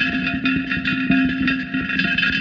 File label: Pot Loop Shake; godsoffortune